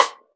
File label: RAW RIM, Drums Acoustic; AKAI; 90s sample CD; USB Soundscan vol.10; RAW KIT 7